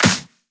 Claps; Vengeance; Essential Clubsounds Vol.4

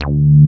bass hummer D, Bass Shots, Chicago House; Classic Chicago House

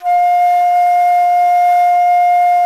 traverse F5; SoundBytes - Early Patches

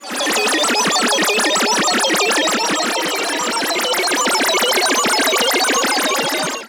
Meltdown

